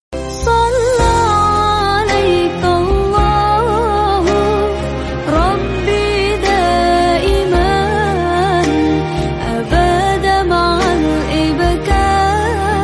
sholawat, Islamic

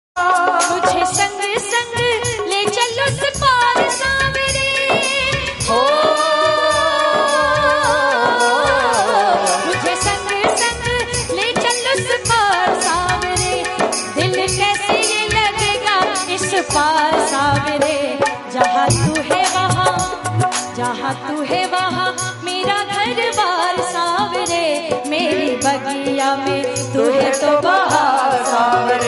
devotional bhajan hindi

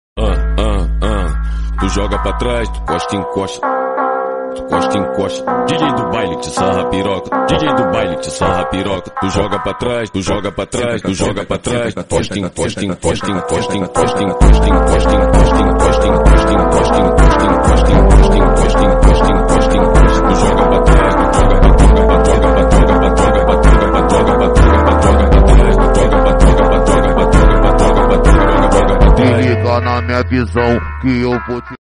english dj remix

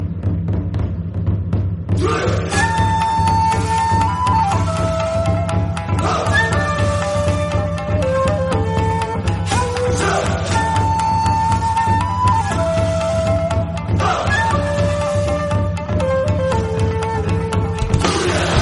theme instrumental

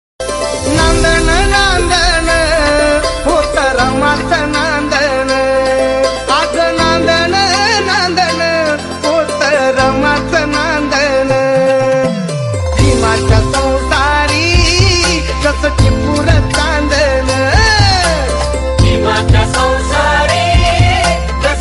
marathi song remix

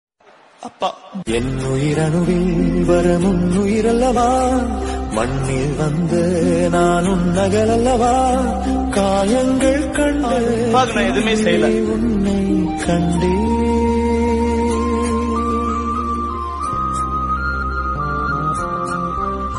appa sad songs appa tamil

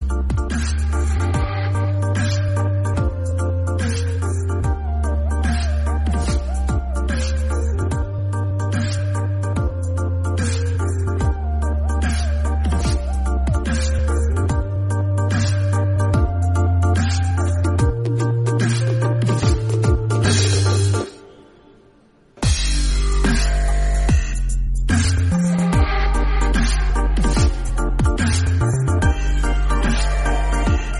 tamil song bgm; ok kanmani

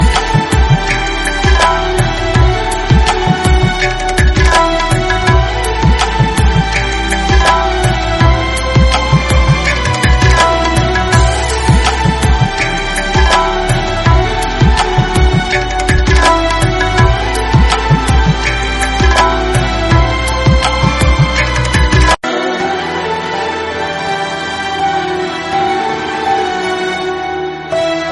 sad instrumental bgm telugu

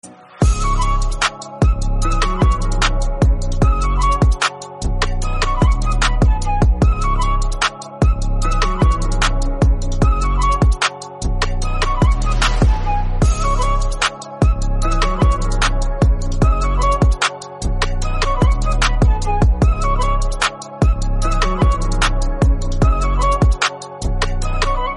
trap oriental beat

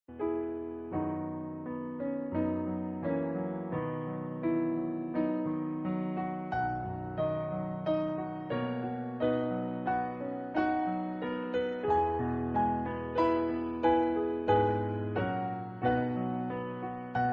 instrumental song

